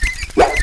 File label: stab miss